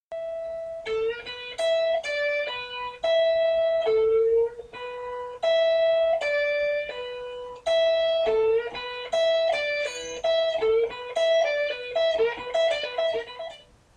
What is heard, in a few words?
short cool blues rock lick, guitar